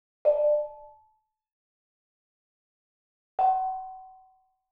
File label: Gamelan; Propeller Island - Complete Gamelan